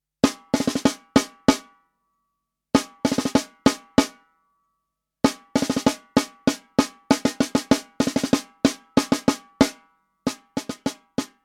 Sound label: Drumnastics; DRUMNASTICS by Peter Matzick; A collection of 10 solos for drum kit and snare drum aimed at the beginning/intermediate student